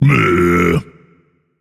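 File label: non-verbal tongue; smite; atlas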